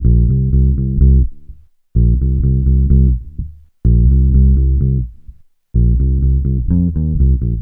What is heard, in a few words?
REGGAE C, Bass Grooves; USB Soundscan vol.30; AKAI